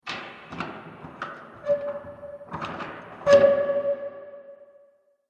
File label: Haunted House Door Noises, Creepy Door